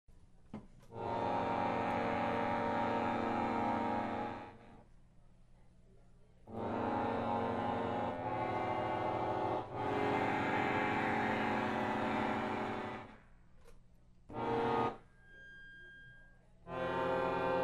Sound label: accordion